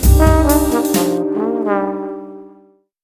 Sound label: Puzzle 3d Jingle After Midnight